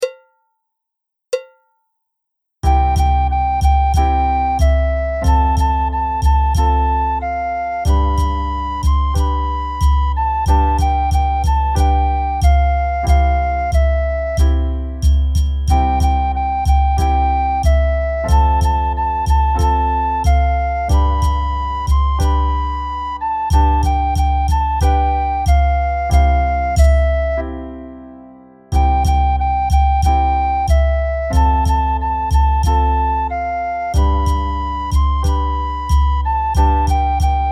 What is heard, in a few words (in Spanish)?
tengo tengo tengo con percusion